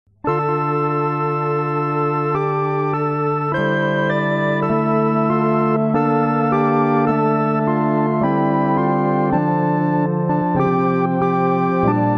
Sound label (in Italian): solo musica